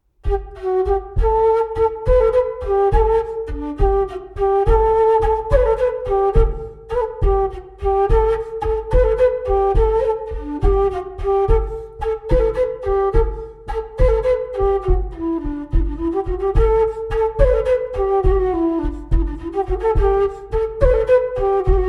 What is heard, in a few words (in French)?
Montagnarde; Recueil De Raoulx